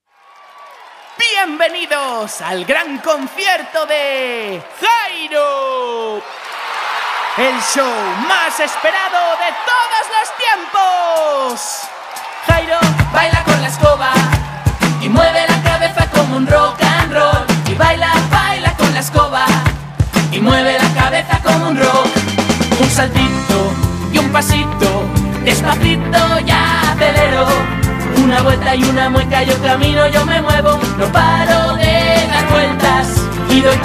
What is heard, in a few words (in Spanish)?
Música personalizada para niños; tina y tin